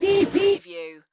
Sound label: Alarm Digital Whoosh